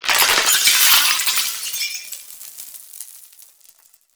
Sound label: Crystal Dissolve; moul